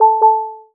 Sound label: prompt distracted; IQ.Pilot; selfdrive